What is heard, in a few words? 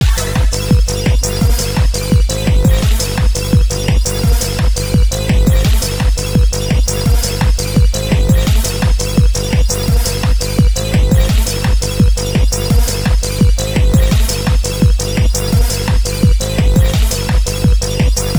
music; Space Shooter Alien